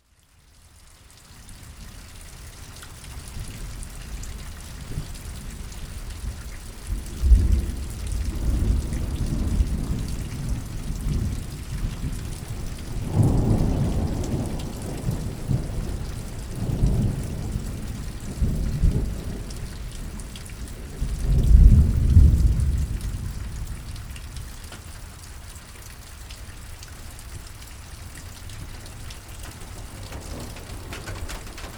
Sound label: Thunderstorm and Rain on Metal Roof